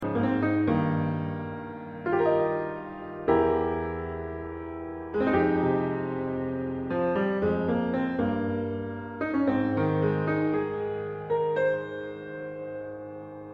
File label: Piano